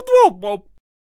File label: Potionseller Attack Vocals